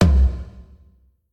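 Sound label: Drums Tom; smite; raijin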